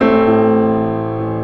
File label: PIA, PIANOS; USB Soundscan vol.02; AKAI